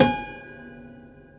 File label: Prepared Piano; Battery 3 Sample Kits